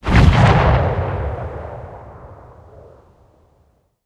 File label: cannon 37mm tail far, guns tanks